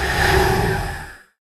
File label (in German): schaden; Barotraumatic; Razzershrimp